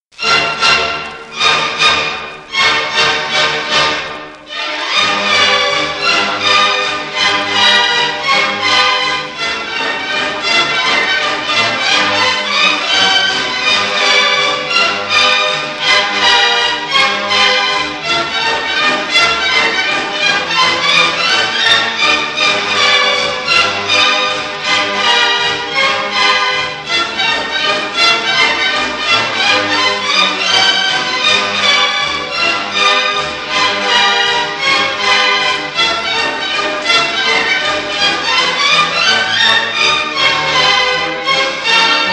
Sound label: Mazurka; TCHAIKOVSKY; SWAN LAKE